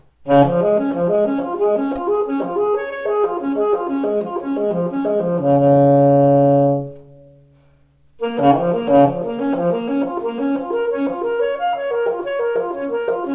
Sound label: Bb Major alto